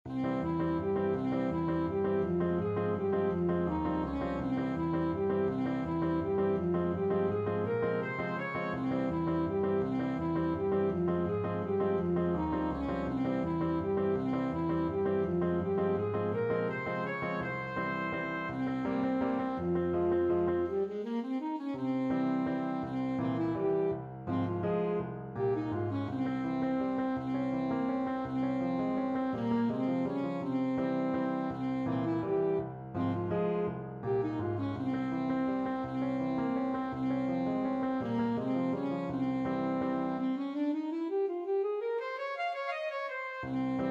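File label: alto saxophone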